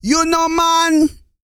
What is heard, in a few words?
REGGAE, Vocal Planet World Voices